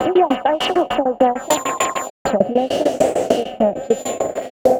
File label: garble, 100bpm; transx loops